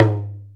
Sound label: PER Percussion; Mix-Percuss